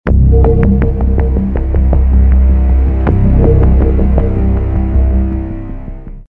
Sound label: Ringtone MP Lobby; Call of Duty: Ghosts; COD Ghosts; infinity ward